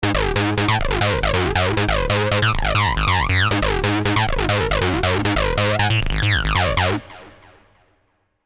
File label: SQ Acid, Sylenth1; Angus; alonso 19 year anniversary pack